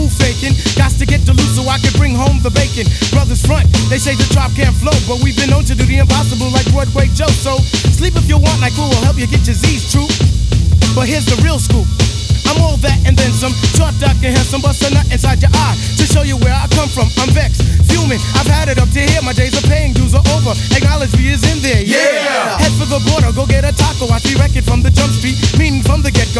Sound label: hiphop